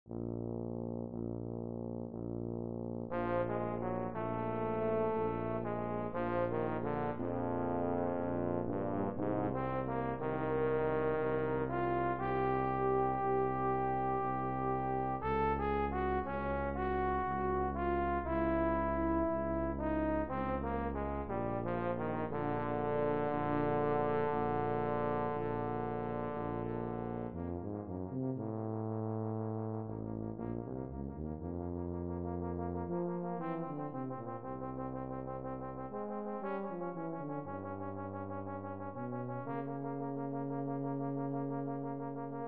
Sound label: Duet, Euphonium